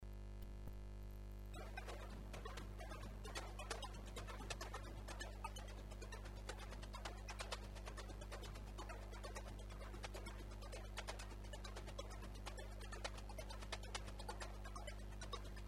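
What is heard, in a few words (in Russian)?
darbuka solo zapis na kassetnyj magnitofon, darbuka solo recorded on a cassette tape recorder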